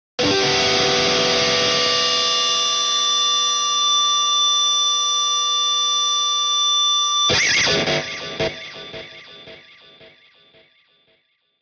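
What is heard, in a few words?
Electric Guitar Going Feedback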